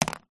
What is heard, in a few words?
household remote control tv plastic set down table; zapsplat